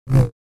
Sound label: Saber; FXHome